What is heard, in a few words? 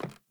Sandals Run Wood, Footsteps